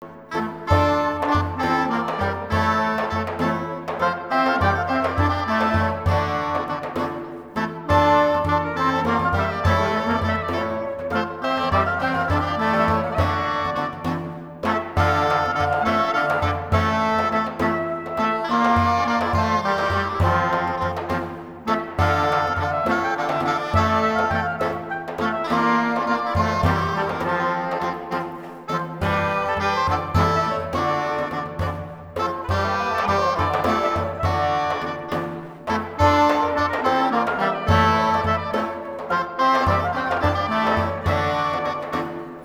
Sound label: Gaillarde; Claude Gervaise